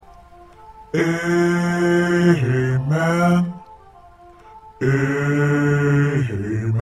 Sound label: Amen Bass